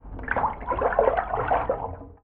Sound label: swim; Ludum Dare 48